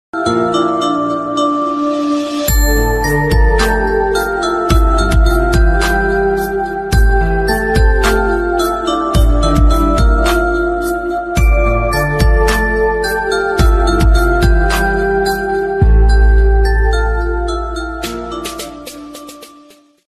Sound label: ringtone new flute instrumental ringing tone, bollywood